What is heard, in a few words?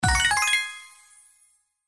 Winning animation, slots; x5 WILD symbol; Sugar Town